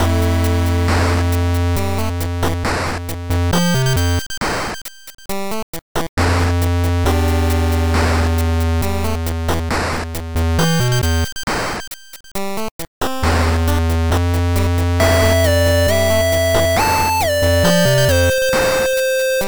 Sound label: 8bit